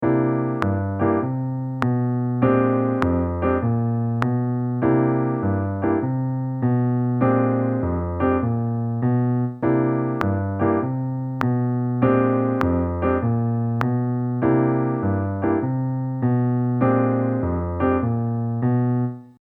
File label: Bluesy Comp one one and done 2 and 4